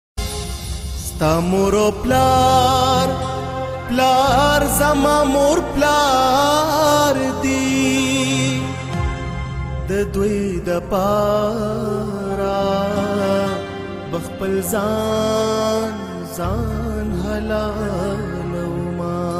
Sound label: pashto new very sad ring tone